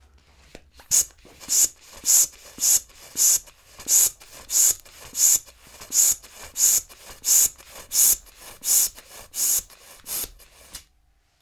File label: Inflating Balloon